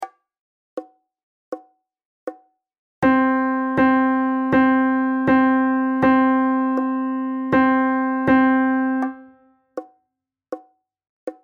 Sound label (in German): keyboard erster rhythmus